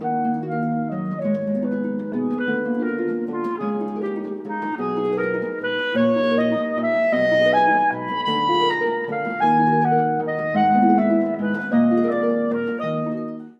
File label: Coastal Suite Rolling Waves